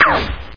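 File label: short cartoon ricochet sound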